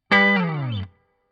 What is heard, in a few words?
Guit Solo, RNR for piano